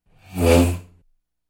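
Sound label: chair scratches floor; stul carapaet pol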